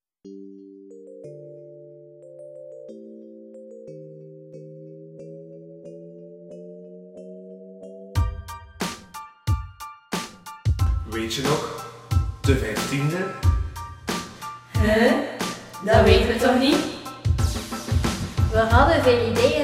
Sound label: rap